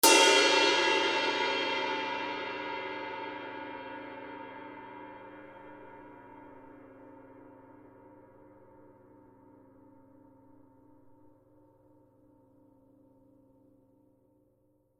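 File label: signature 22 dry heavy ride edge, cymbales; Forgées à partir d’un alliage secret de bronze; paiste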